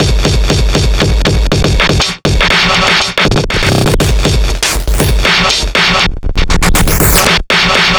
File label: Beat, Modular Electronica; Sonic Foundry (Sony Creative Software); TSS Autopilot